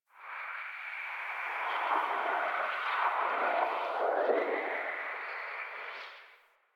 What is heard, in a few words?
Venus Atmosphere Gust, Ambience; Warframe; Venus Open World; Venus Landscape